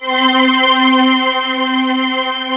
STR, YAMAHA, Analog; Analog Fantasies; USB Soundscan vol.49; AKAI

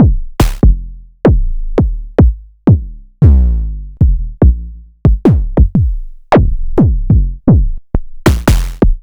ms20 raw kicks

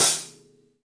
V-Pop Closed Hi Hat, Roland BK-5

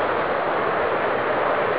crowd; speedball2; Amiga Soundtracker Sample Packs

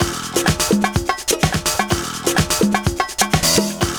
PERCUS; Univers Sons - Kitch 70s

